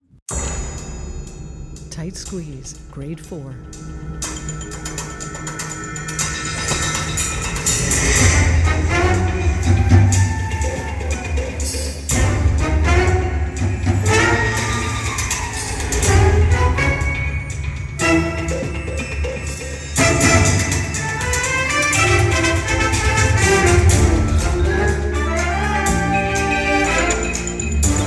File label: large ensemble